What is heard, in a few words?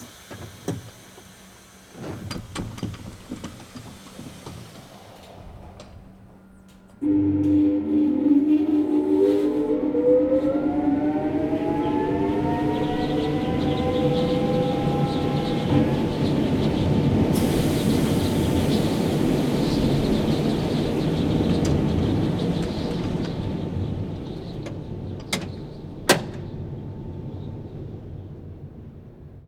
tram door closes go; best service Blue Box